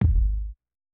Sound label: COLLISION BASS LITE; SIMUZENSOUND